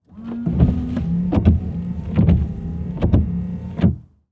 Transportation Cars Honda Civic Run Windshield Wipers Squirt Fluid